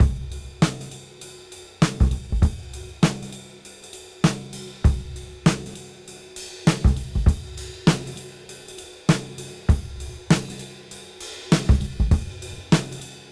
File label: Cym Beat 99 BPM